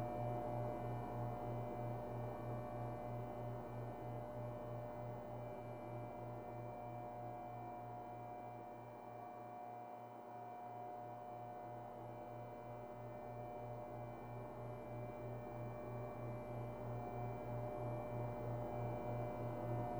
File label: Room Tone; Blind Survivor